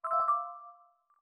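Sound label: bell glitch C major; granular; accents; bespoke - Floral v.2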